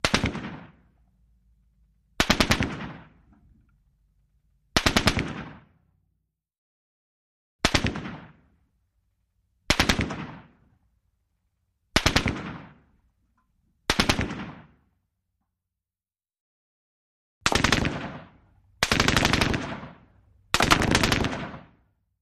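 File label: M60 Bursts Bullet Impact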